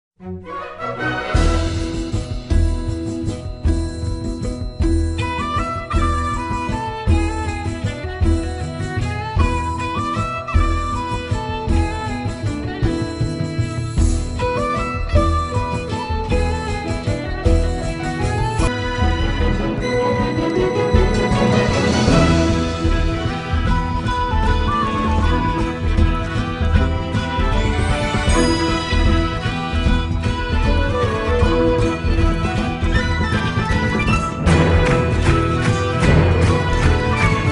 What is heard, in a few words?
kingdom dance floor music